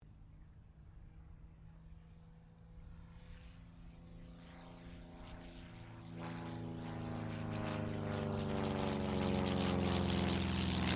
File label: AIRCRAFT CESSNA 0-1 BIRDDOG PASS, spotter aircraft; Airplane Sound Effects; Airplanes (Sound Effects:Vehicles)